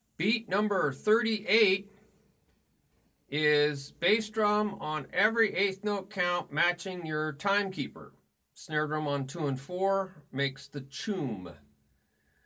Beat 1 2 3 4 Toom Toom Choom Toom Toom Toom Choom Toom